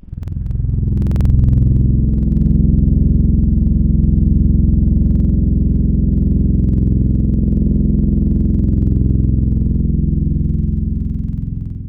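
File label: brass Trombone, Wavetables; Synthetic's Serum Collection; Standalone-Music SYMPHONY Wavetables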